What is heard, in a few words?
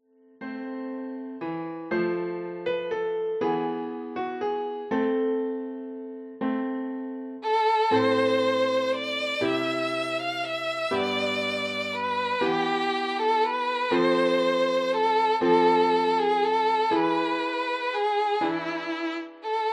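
Greensleeves violin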